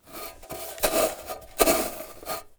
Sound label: Industry Tool Shovel In And Out Dirt